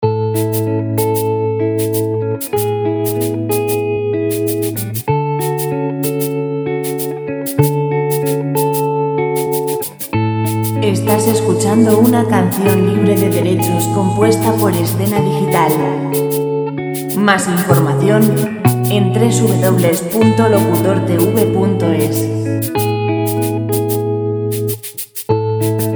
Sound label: lent guitar royaltyfreemusic; guitar background music